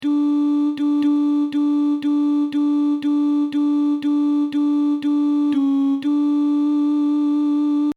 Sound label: Alto; Allelulia